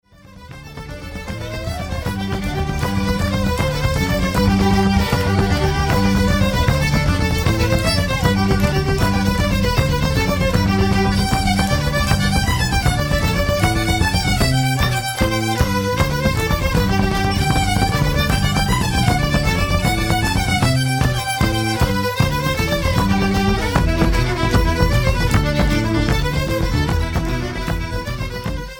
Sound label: The Irish Washer Woman